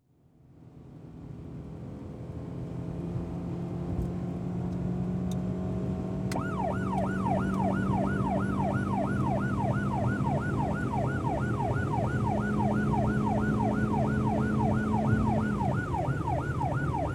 Police Car Constant Run With Wail; Emergency; BBC Sound Effects Library

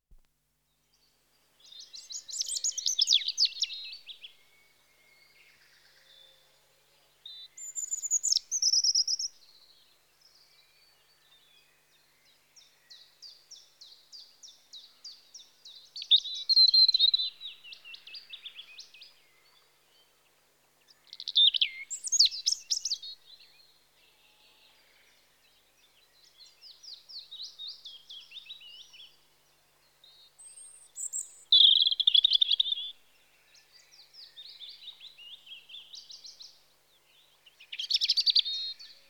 Robin (Erithacus Rubecula) Spring; Birds; BBC Sound Effects Library

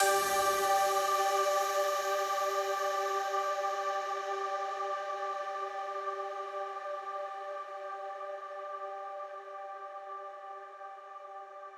Ahh G; 99Sounds; Simply Space

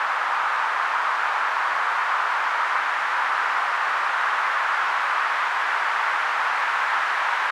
Ambient Noise, White Noise

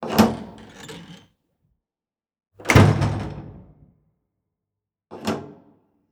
Dungeon Door Open, Close, Locked; 344 Audio; Practical Doors

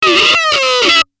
Aliens Monsters; FXHome

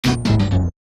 Tech, Weird Side Samples

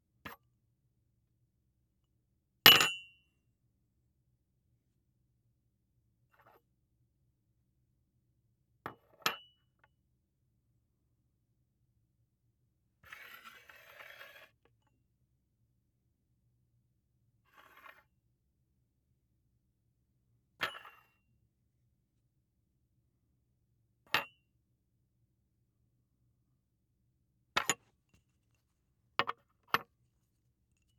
CUP, Crockery & Surfaces; TheWorkRoom Audio Post; Empty

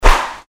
Fighting Swing Sound; FXHome

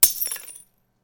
Glass; FXHome

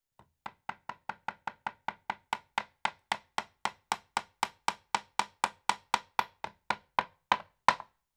Tack Nail Hammered; Construction; BBC Sound Effects Library

